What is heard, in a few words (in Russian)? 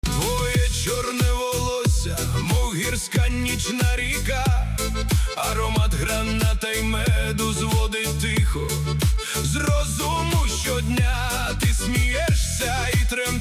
pyana melodiya